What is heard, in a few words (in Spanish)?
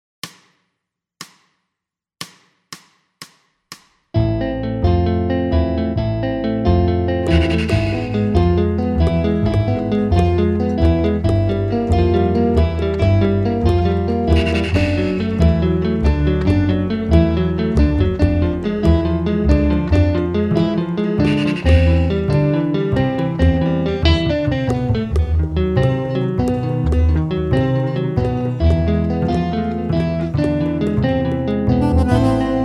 saxo